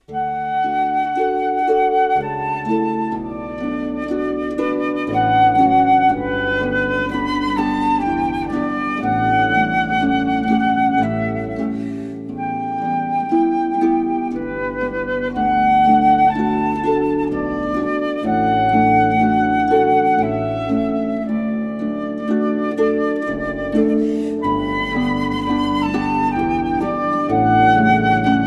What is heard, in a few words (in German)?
Harfe Flöte; Mascagni